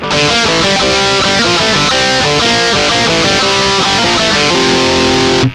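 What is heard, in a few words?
Strat Marshall bridge, Bridge pickup, Sansamp в режиме Marshall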